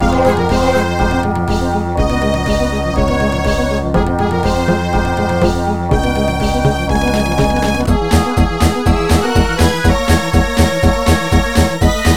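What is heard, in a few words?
background music level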